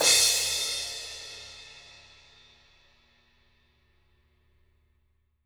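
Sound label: 16 crash ff, jazz crashes, Living Drums; Sampleheads; Peter Erskine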